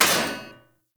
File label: Short Sword Thrown Impact Heavy Armour